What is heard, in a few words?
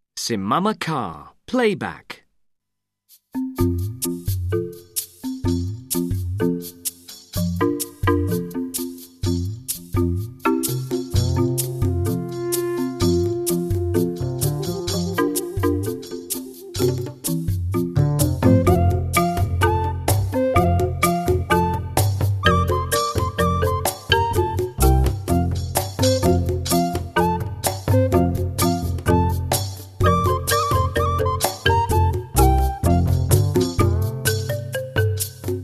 simama kaa playback